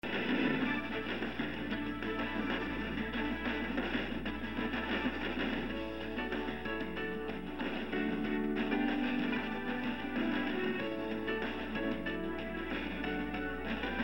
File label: opening theme music